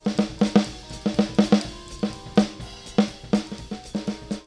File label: snr e606; smpro